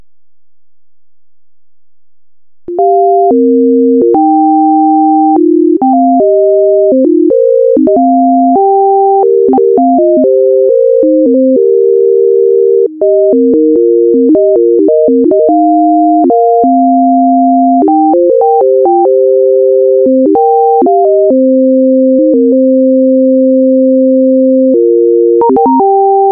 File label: flute